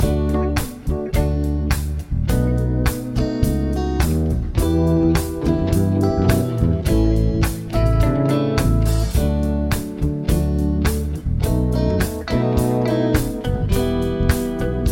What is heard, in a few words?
progs with applied chords